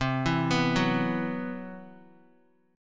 C Major 7th Flat 5th down Guitar; CM7b5 arpeggiated; JGuitar

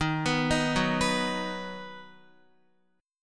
Eb Minor Sharp 5th, Guitar, Ebm#5 chord; arpeggiated; JGuitar

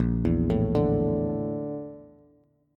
Bb Major 7th Flat 5th Bb Bass; BbM7b5 arpeggiated; JGuitar

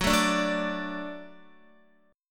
Gb Minor Sharp 5th Gb Guitar; strummed; JGuitar

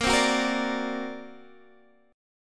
D Augmented 7th Bb, guitar

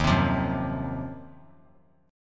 Eb Minor 6th Add 9th Eb down 3C,3F,3A,3D; strummed

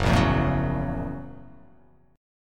Gb 7th Sharp 9th Gb; strummed; Gb7#9; 2,1,2,2,1,1,x,x; JGuitar

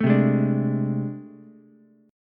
A Minor Major 11th, down, Ukulele; strummed; AmM11; JGuitar

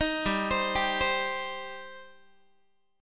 G Suspended 4th Sharp 5th, Guitar; Gsus4#5 arpeggiated; 18,15,15,17,16,x

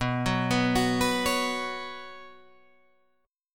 B Suspended 2nd, Guitar, Drop D

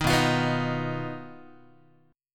B Major Flat 5th B down Guitar; BMb5; strummed

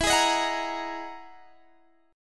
E Major Sharp 11th, Guitar, Drop D; chord shape

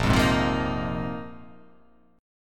Bb 9th Suspended 4th Bb down, Bb9sus4 chord; strummed; JGuitar

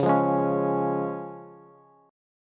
C sharp Major, Bass; strummed; JGuitar

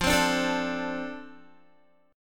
F 6th F down Guitar; F6 strummed

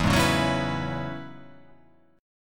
E 6th Add 9th E down

